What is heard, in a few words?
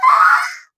Death Voice